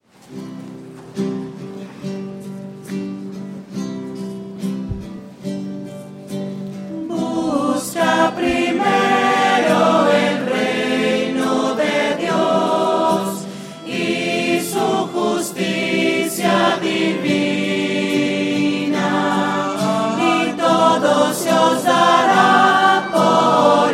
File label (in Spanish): voces; misas 2012